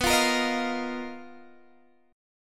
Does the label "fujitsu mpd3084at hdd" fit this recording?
No